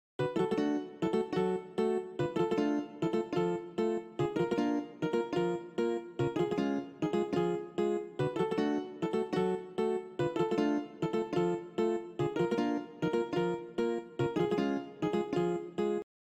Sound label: cont rhythm example